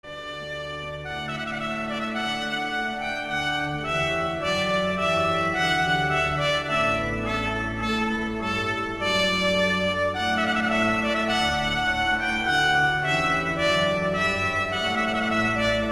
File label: Traditional Wedding Music Bride Entrance